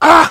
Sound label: heavy pain sharp